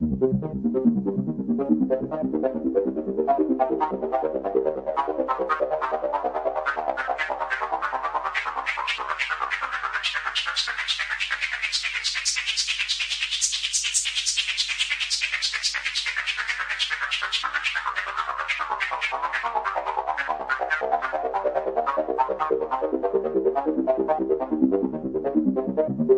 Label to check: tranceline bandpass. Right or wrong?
Right